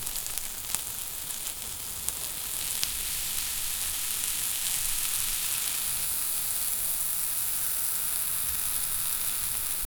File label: veggies getting roasted o, roasting